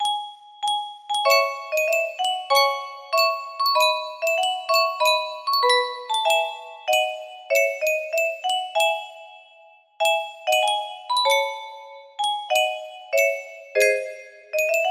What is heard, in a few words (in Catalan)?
yunsheng caixeta de musica, music box melody